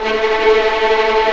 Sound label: A3 TREM, VLNS TREMOLO, String; East West; The Ultimate String Collection